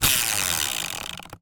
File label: leisure game spin wheel; game of life; zapsplat